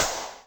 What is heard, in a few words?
S Gate, Electric Snare, Yamaha DTXpress